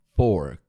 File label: fork